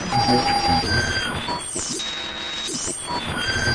radio noise; Radio Tuning Noises

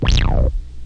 lo squirt, Synth

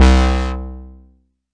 Arturia MiniBrute syn

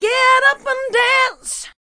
get up and dance, female singing; VOCALS and SPOKEN WORD

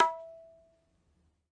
Ta, Tabla; ETHNIC and WORLD PERCUSSION; Tabla Kit Large

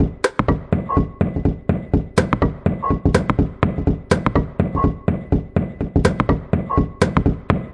Machine City Found Percussion

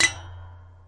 found sound forkoophone; UNUSUAL KITS and FX; Werkstatt Found Sound Kit

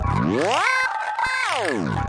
114 alarm weirdness; SYNTH AND ELECTRONIC LOOPS

